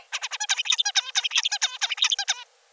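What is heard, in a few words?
83 day old, song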